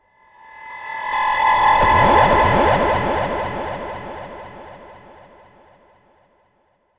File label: FX Alarm; Vengeance Samples